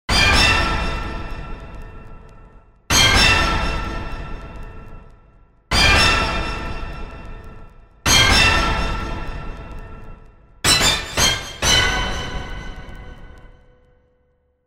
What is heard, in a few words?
orchestra hit